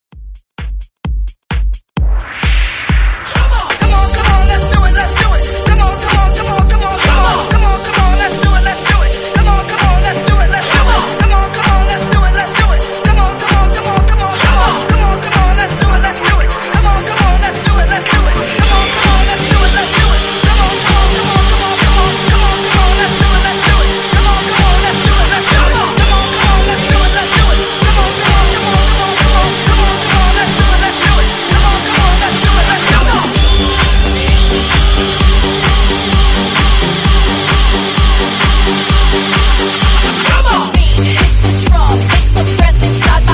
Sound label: Hype Re Drum 130 Bpm; DJDOLLS